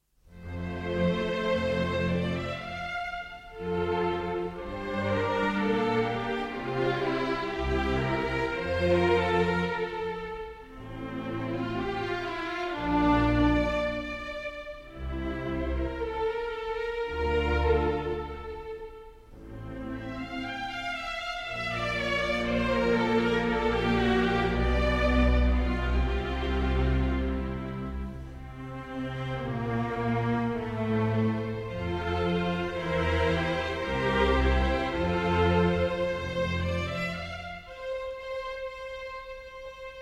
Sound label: Cello Concerto in C Major, Adagio; Haydn; No. 1; Hob. VIIb1